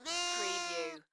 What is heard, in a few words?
Lamb bleating; beanstalk audio